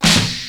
RIMSHOT; BEAT2000

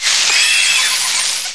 APPLAUS

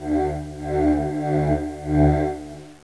HAHA MAN

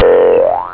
boing, cartoons

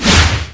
MISSIL; MEGARACE

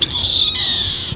HAWK CRY; ANIMALS

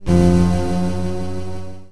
WARP

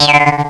JAWHARP

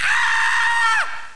scream, vocals

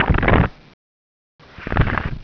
SHUFFLE

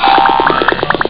boingity

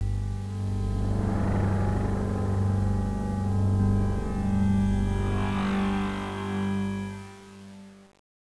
STING

